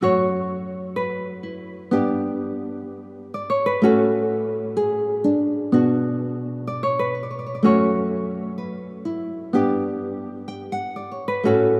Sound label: Various Melody Loop - 126 BPM B Min Guitar; Loop Stems; Cymatics; Oracle Sample Pack